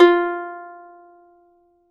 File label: Plucked Lyre F4; Acoustic and Electric; Polyend; Simulacrum Sounds; 1 Shots